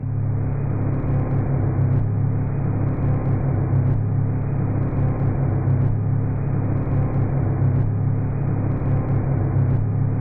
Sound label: Boardroom Ambience Loop; Going Under